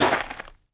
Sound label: pl gravel, player